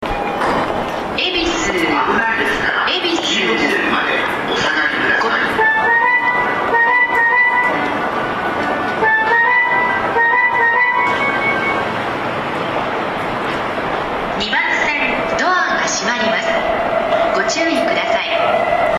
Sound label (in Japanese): 恵比寿駅, 山手線, 品川・東京方面; 埼京線・湘南新宿ライン; 新宿・池袋方面; 以前とはアレンジが異なります。